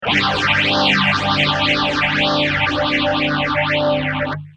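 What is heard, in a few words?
guitar Mutron biphase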